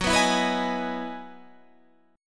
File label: E Major 9th F sharp; strummed